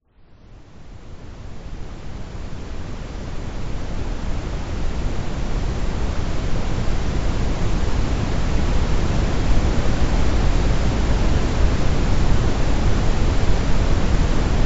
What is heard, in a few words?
brown noise; relax